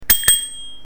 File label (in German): Fahrradklingel; Lord Helmchen